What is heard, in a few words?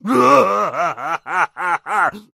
pig laughing; king pig